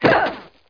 land; Daikatana; mikiko

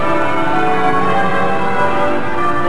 church; tapache

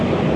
road noise; Stunt GP

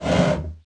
drawer

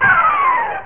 FALL; Japan; Panasonic; funcity; tokyo; tower

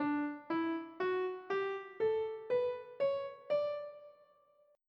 D SCALE

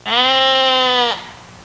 sheep; Sound Effects for Multimedia - Volume 1

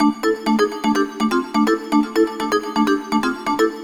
SYNTH HOUSE 125 A, Keys; eJay

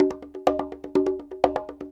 PERC LOOP ETHNO 125

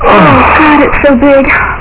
Bonus Paddle Big; Pornoid

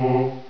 choir; Enciclopedia Del Perro

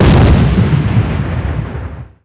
Boom; Falcon 40

